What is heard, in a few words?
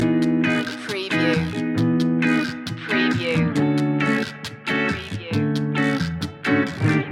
lounge lizard loop